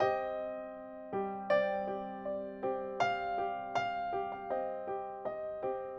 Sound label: Emotional Piano